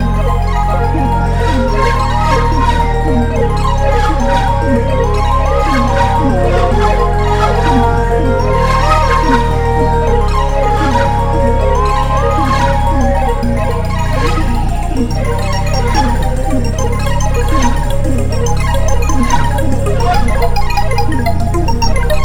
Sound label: tangle synth miscellaneous; tangle synth by toybox